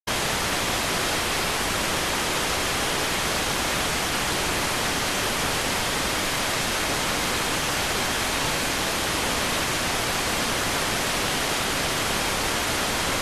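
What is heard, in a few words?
radioteletype receive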